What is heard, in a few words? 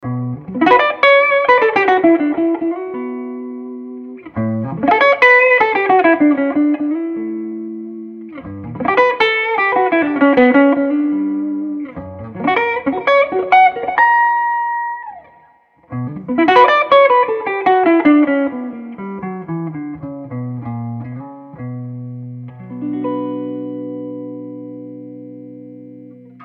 Fender Hot Rod Deluxe